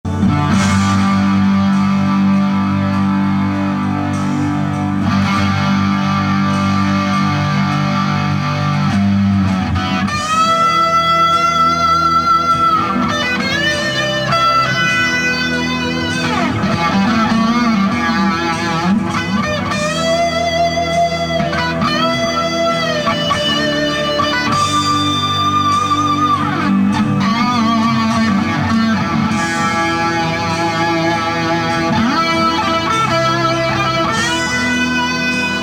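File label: Seymour Duncan SSL5 pup with green Big Muff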